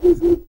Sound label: TUV BLOW, World Voices, TUVAN GROAN; Spectrasonics Vocal Planet CD5